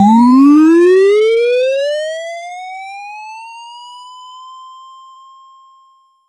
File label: jingle when recei, power up; metroid